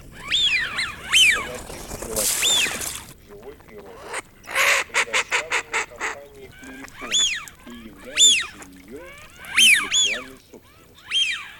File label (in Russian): Свиязь Fischione; plurifon